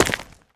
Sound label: dirt, footsteps